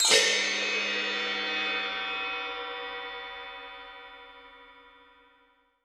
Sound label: gong